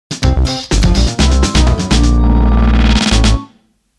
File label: (Jingle) Challenge Lost